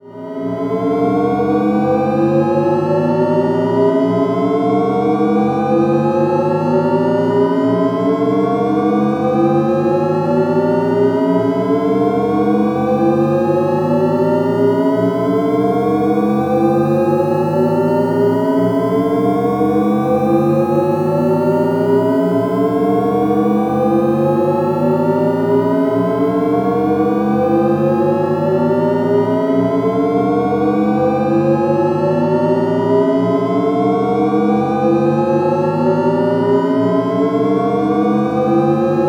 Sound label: Shepard high Tri Rev; experimental